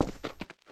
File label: concrete, player footsteps; hl2